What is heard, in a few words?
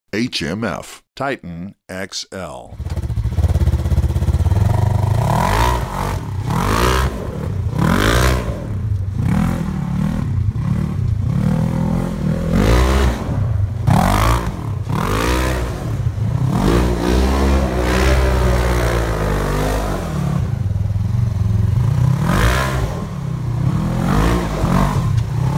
polaris ace 900 xc sound clip; hmf